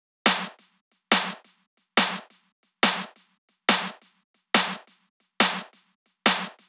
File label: drum Loop 140BPM Clap